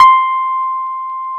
Keyboards Old School, C5; USB Soundscan vol.09; AKAI

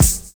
SUB HH; Univers Sons - Kitch 70s

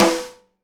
SNARE RIM, Live Snares

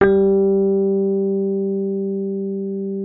MKFF G3, RHODES; RHODES A; Xsample VOL-1

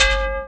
Perc OneShot, Processed Percussion; One Shots; Sonic Foundry (Sony Creative Software); Rhythmicronics

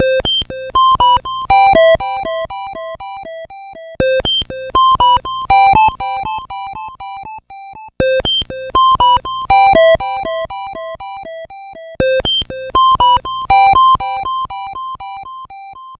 Atari 2600 Synth FX

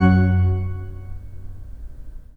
PIPE, 3DOrgans

